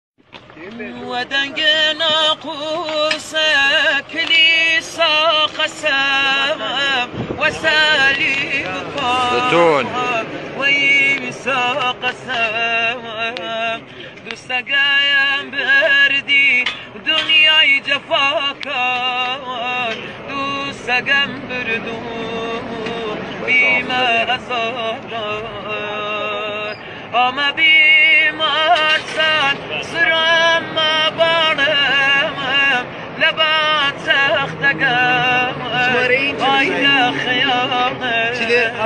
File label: kordi live voice